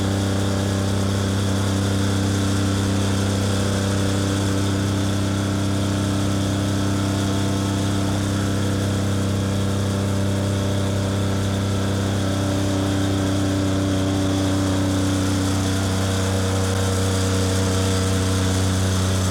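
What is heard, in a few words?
Diesel Truck Steady Driving; Poetry Project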